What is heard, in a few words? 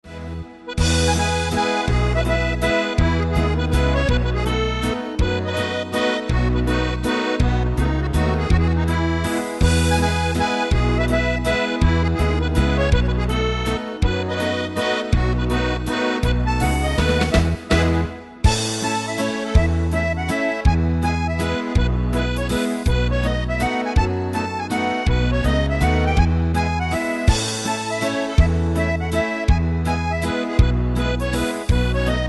mazurka